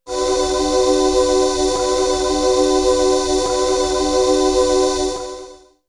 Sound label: Melody oneshot; ZERO G - Datafile DISC 2